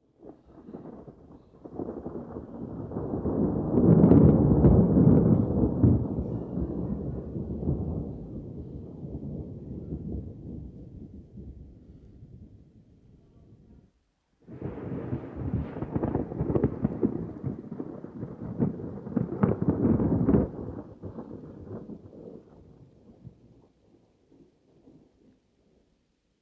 Dist Thunder Lng Roll

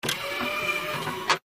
Robotic Arm Movement, robots

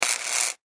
Arc Welder; arcs & sparks

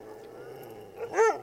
Dog Growl To Bark

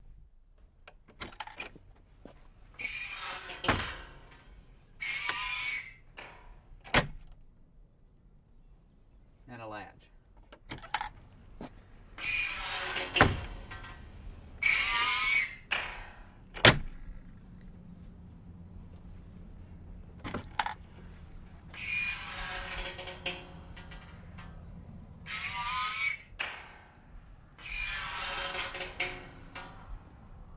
Spring Gate Open, Close; gate & big rolling

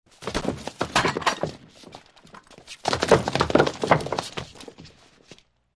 Body Falling Fight

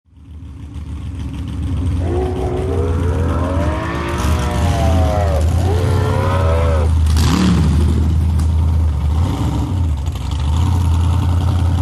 Drag Boat Start idle

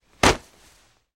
Nylon Swishes Quick, whooshes & swishes

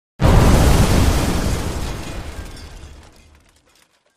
Explosion Debris, metal & glass

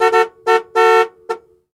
Jeep Chrke Horn Honk; jeep cherokee 84

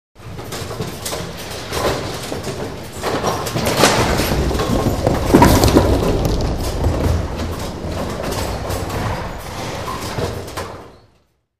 Bowling Pin Setting

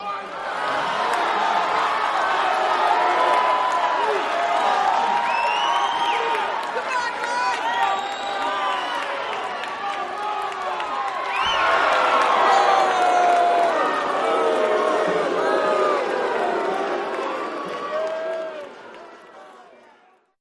Crowd Walla, boxing

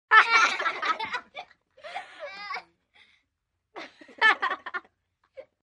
5 Kids Laugh Burst

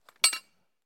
Metal Animal Traps

